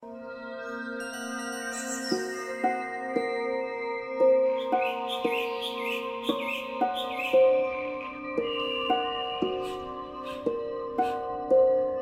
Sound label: Elven Instr Amb; Enchanted Forest